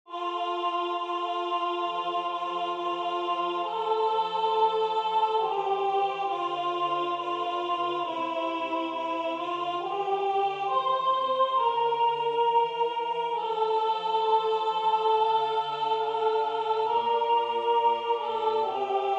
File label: alto; Constitues eos; Ravanello